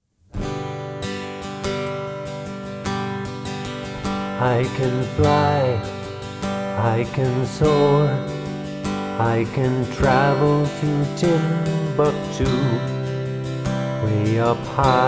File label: Crane song practice